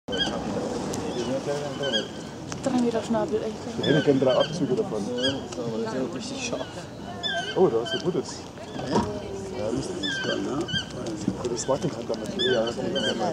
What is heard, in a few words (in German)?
Tukan Schrei